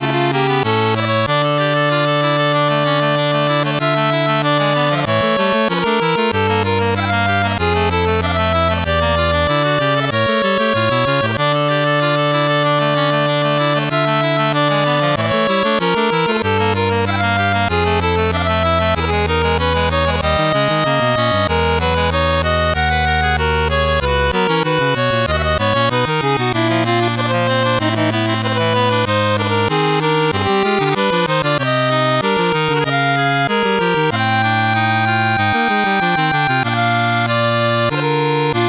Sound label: 8bit bgm fast